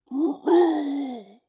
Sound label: Zombie Attack